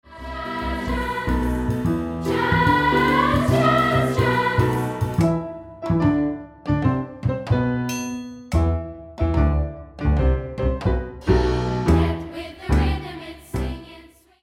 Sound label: Jazz; Demonstration